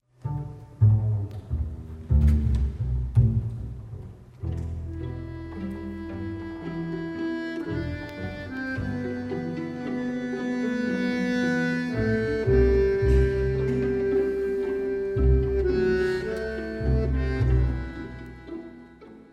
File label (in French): quintet CORDES